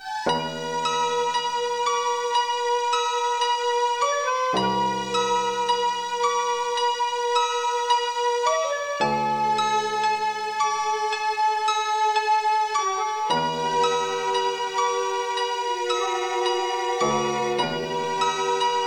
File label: asian oriental